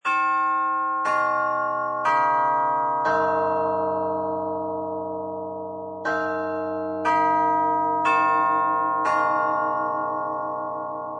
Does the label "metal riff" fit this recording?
No